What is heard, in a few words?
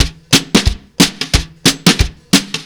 COUNTRY